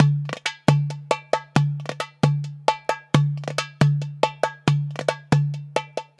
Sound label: DERBUKA; USB Soundscan vol.63; AKAI